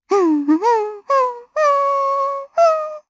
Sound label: Vox Hum, jp; Estelle; kingsraid